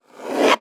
Hard Slide Accelerate

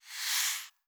Soft Slide Medium

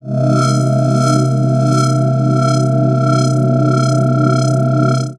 Sci-fi Rumbling Ambience

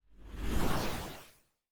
Swoosh Transition

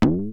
Deep Doink

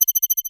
Multiple UI Beeps

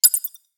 Arpeggiated UI Beep

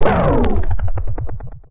decelerating sci-fi drone

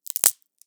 Wood Crackle

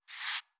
Soft Slide Short

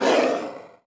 Hard Slide Decelerate

